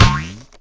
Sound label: Tap; Pinata; Clicker game